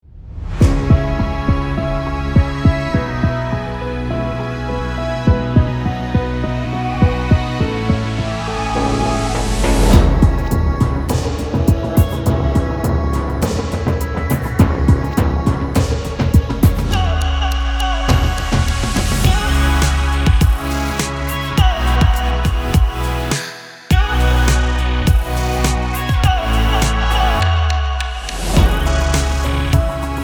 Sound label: URBAN